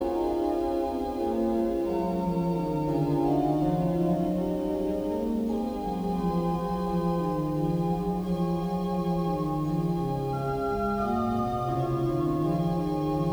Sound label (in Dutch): orgel